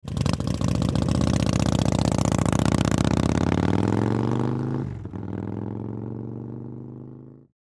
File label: Motorcycle start and away medium speed; vehicle